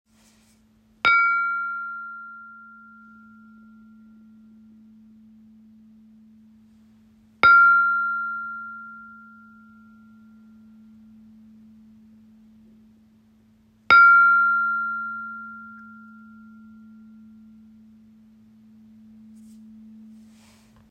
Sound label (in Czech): Vidlice F; mísy - zvuky